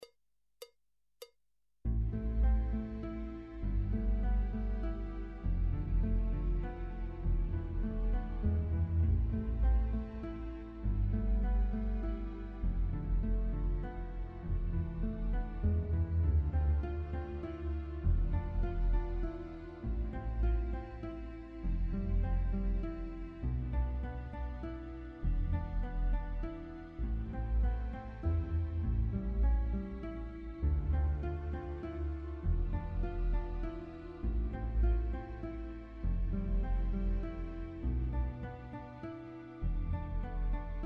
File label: strings